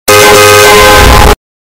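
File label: car horn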